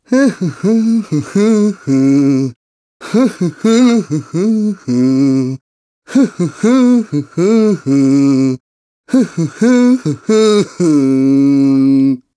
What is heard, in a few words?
Dance; Zelda; ZSS; Ghirahim